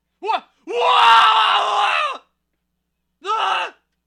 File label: Man Scream